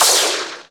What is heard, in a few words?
Gunshot